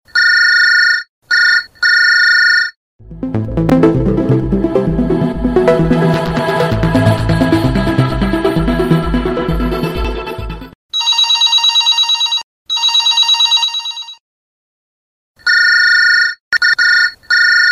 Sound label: Galaxy S4 Ring